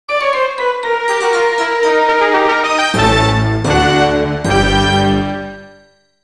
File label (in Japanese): 負けジングル; Monster Farm 1 2